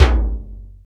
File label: R8 PRC; E-Lab; X Static Goldmine 1